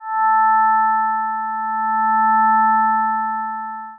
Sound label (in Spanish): alarm campana suave